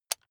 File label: electric button drill direction switch lock heavy